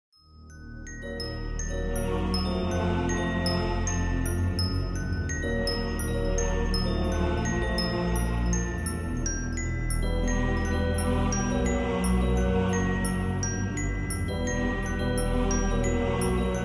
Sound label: Horror; The Black Mirror